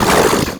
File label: imp chatter combat, monsters; DoomRTX